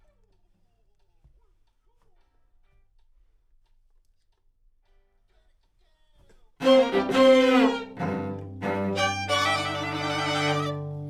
Alt, piano

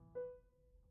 Piano Mono

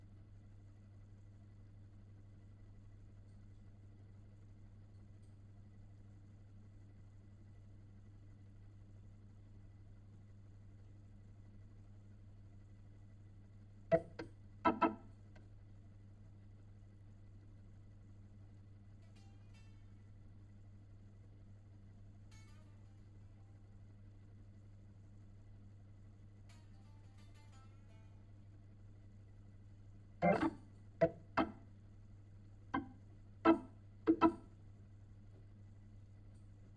Hammond Leslie

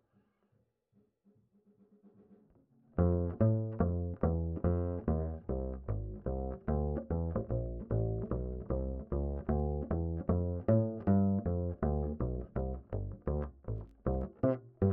Bass Line

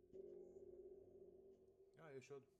whoosh

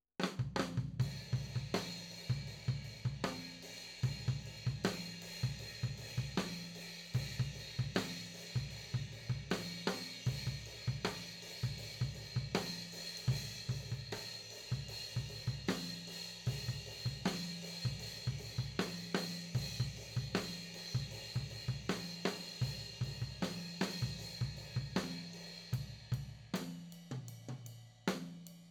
FLOOR

